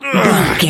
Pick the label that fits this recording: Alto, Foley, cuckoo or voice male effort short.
voice male effort short